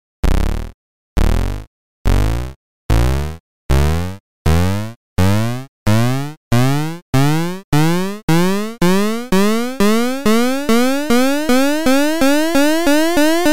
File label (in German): Flummi Ploink